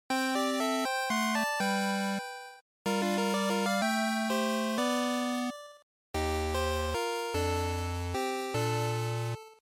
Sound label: GB RPG